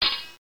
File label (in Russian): zheleznij metall padayushij zvuk